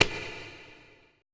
E TAB SLP

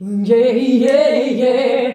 "HNYEAHI" G, FEMALES; Best Service; Hyper Dance

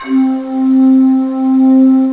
SQ1 METAL, Synthesizers, synbrass

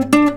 NYL GTR, Nylon Guitar; E-MU Producer Series Vol. 8 – Platinum Phatt

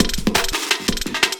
LOOP, 170 BPM; Ueberschall; Drum & Bass Flash Trackz

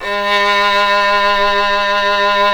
SOL VIO 6, Synclavier Strings; ILIO

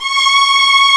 violins; E-MU Formula 4000 Series Vol. 3 – Analog Odyssey; VK+ Instruments

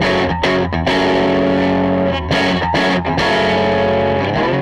SG Guitar Loop

